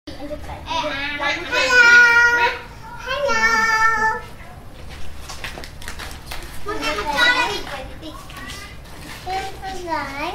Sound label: kids talking laughing, cambodia